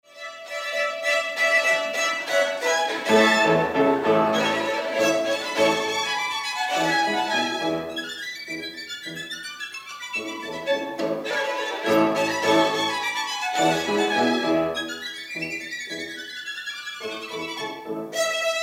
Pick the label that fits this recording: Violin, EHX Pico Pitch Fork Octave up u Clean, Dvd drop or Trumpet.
Violin